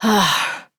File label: Breath Relieved Adult; New War; Adult Operator